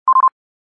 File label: beep